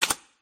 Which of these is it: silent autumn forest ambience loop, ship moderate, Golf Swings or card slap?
card slap